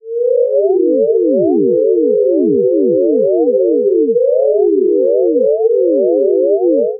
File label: glissons diverge